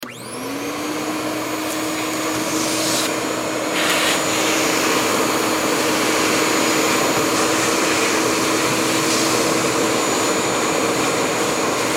Shop Vac, Shop Vac Vacuum 16 Gallon 6 Hp Turn On Run Turn Off; Cleaning; Industry